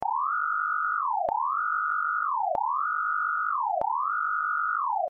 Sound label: alarm, undertale